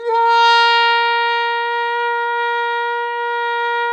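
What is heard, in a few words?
Plunger Mute, Brass; Roland L-CD702; Plunger & Wa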